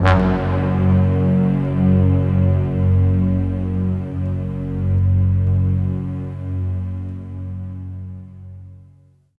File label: bass endings; Orchestral Loops